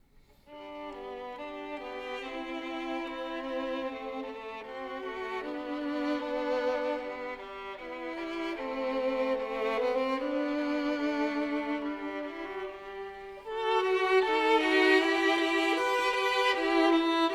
Duos for Two Violins, Dance

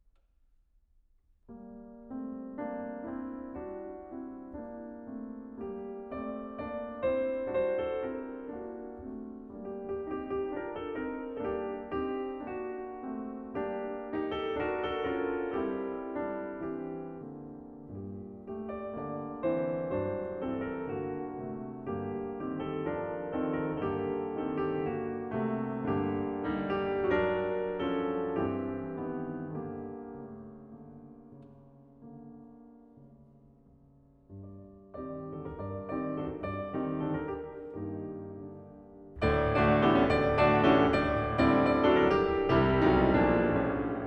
Lullaby, Piano; Norwegian Folk Songs, Op. 66 XV.; Grieg Complete Piano Works

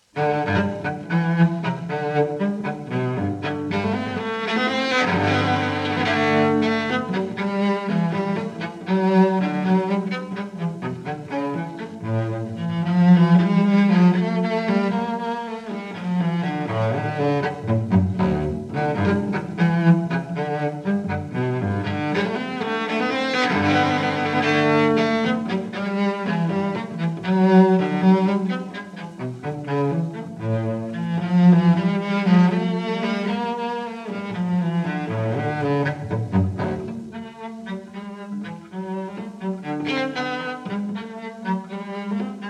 Suites for Solo Cello NO.1 in G Major VII. Gigue; Live; Bach Suites for Solo Cello, Bvw 1007-1012 (Live)

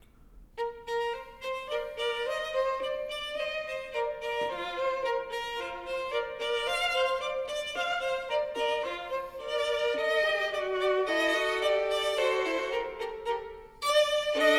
Duos for Two Violins, Burlesque

